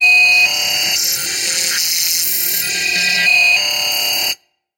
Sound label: production element data element, Distortion Glitch; PremiumBeat